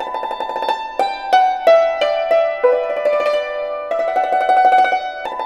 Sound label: YNCHIN 90 D; INIS - Opium